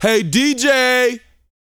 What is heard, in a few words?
VOCAL F; ILIO